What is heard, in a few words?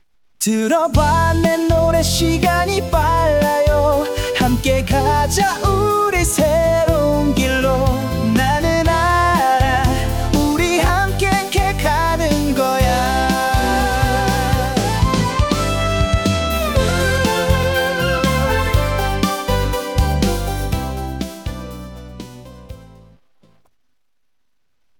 njs dance M; acestep